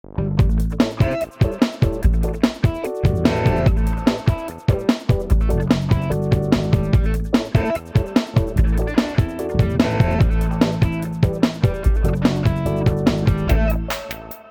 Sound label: jingle instrumental; BARRY